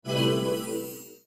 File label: Arctic close, Nokia; audio themes